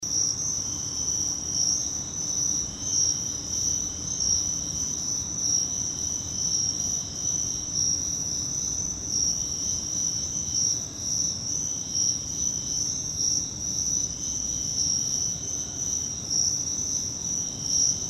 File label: Virginia Crickets